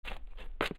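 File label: gritty cement shuffle, footsteps; chief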